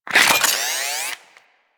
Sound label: SMG Reload, weapons; Protea